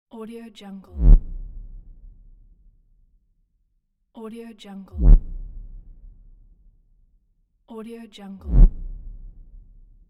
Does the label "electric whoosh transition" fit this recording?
Yes